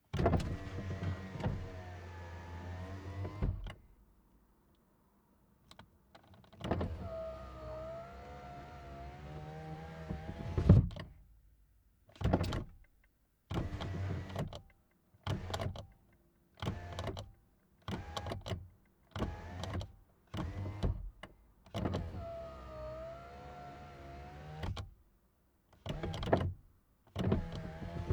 Interior front opening closing window, Honda Accord Type S; mono; Ivo Vicic